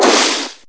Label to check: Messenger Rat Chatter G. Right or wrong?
Wrong